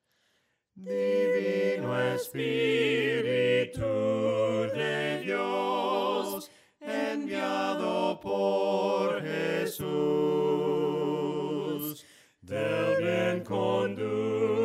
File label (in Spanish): Divino Espiritu de Dios melodia palabras